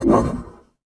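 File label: Mech Fidget Vox; Sid Meier's Civilization 4 Complete; Beyond the Sword; Units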